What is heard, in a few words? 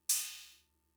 Cymbal, Fricke MFB-522, Drum Machines